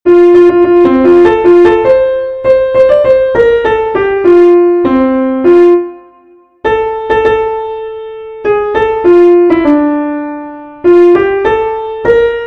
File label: Piano; Ataturk Okulu Marsi